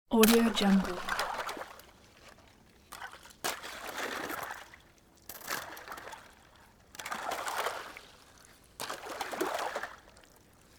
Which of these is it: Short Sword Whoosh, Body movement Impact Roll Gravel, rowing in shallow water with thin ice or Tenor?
rowing in shallow water with thin ice